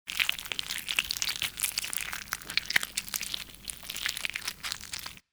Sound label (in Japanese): zonbi